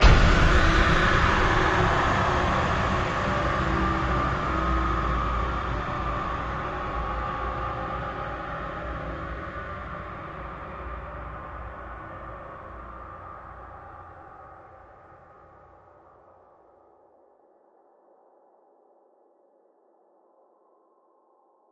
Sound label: Horror Thud Impact